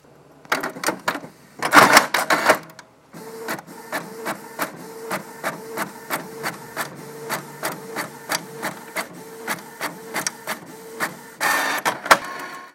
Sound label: printer